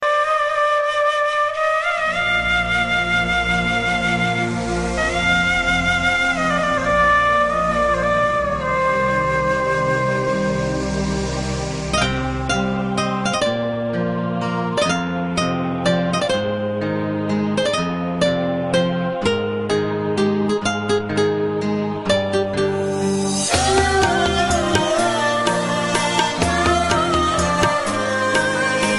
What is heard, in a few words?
Romantic